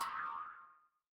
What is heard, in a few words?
cartoon boing